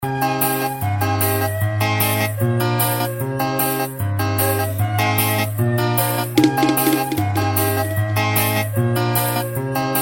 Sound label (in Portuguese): piseiro e sertanejo